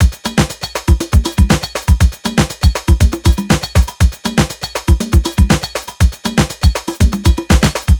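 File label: 120 beat busy, Nu Jazz House; PowerFX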